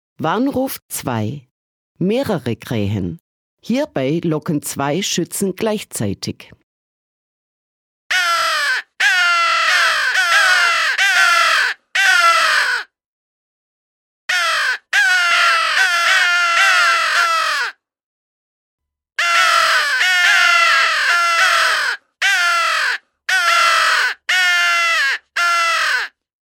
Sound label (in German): Warnruf 2 - Mehrere Kraehen